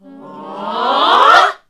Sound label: noise, classic vox; Best Service; Hallelujah